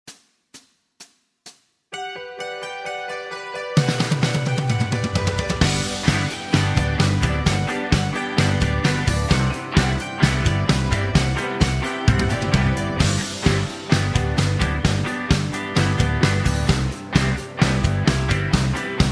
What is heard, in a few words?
G No Backing Vocals, backing tracks